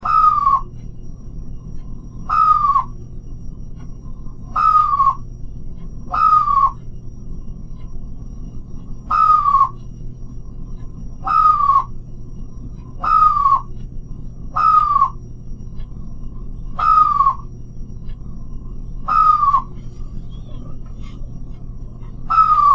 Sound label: chirp